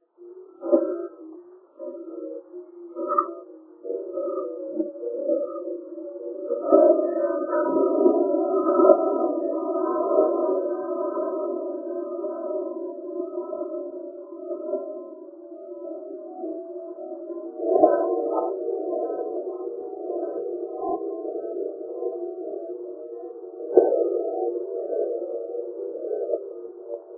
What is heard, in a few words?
puuoo collapse; nature